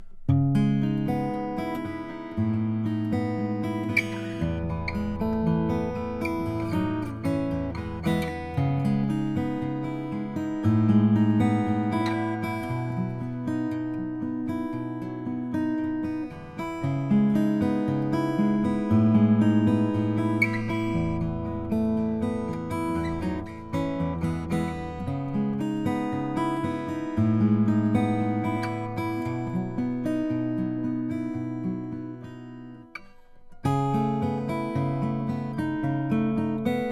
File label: osthoff om mahogany